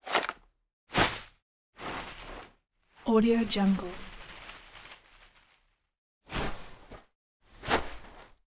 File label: paper plane